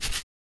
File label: VOC PERC, Vocal Planet Jazz FX BASS PERC